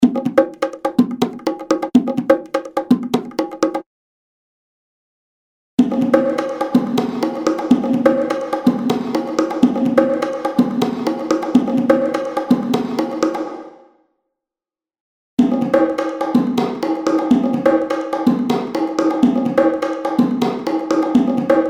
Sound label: perc loop